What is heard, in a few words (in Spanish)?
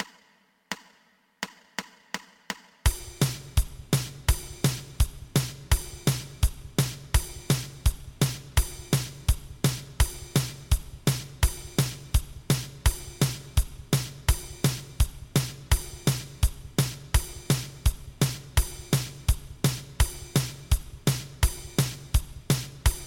2 pulsos